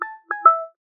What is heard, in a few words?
Motorola Edge 30 Ultra Sms Ringtone